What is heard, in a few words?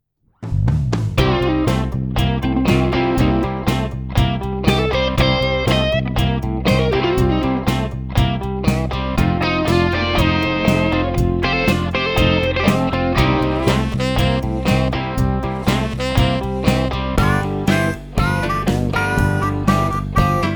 Blues